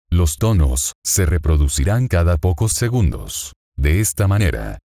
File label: Tones Play